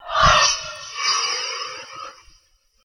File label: yawn Reverse